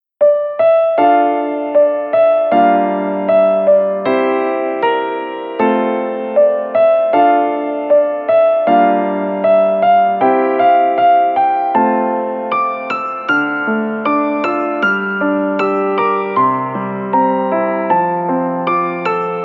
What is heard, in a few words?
piano; maoudamashii